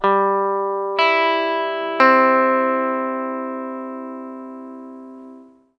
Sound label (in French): timbr